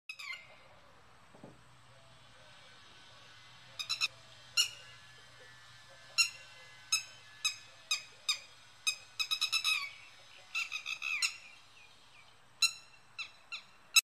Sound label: Red wattled lapwing